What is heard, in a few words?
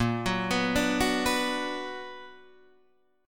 B Minor Bb, Bm/Bb chord, guitar; arpeggiated